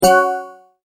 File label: multimedia tone exclamation; McKinney Sound